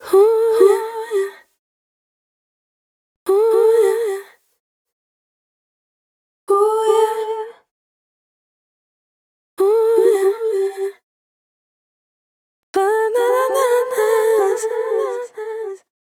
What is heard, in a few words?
lexicon pcm random delay; Delay-Plugins